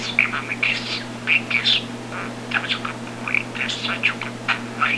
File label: big kiss